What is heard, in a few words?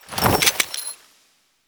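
UI select menu; Veilbreaker; Kahl Customization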